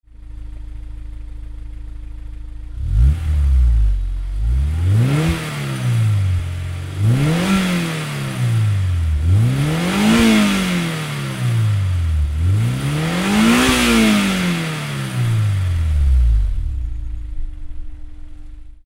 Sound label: Magnaflow Exhaust